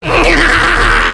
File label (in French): rire